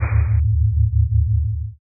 horror title

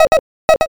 frog

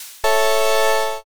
appear

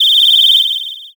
whistle long

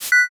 transition

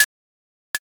stopwatch

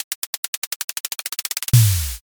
drumroll

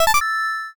rank up

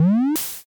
compressor

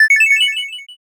sparkle

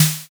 snare

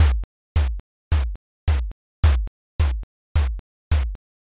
bon taiko

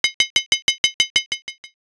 stir

